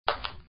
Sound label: footstep soft